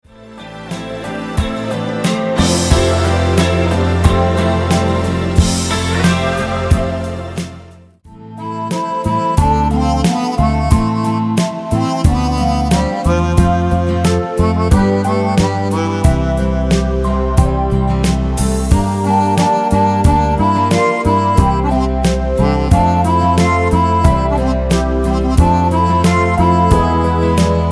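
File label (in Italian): Versione Uomo Canto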